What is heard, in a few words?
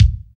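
bass drum, BD Real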